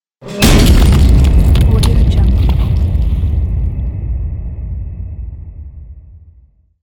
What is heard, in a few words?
bang in